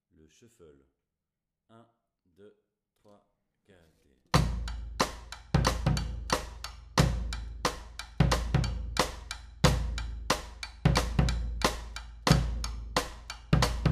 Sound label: Shuffle Intro, Batucada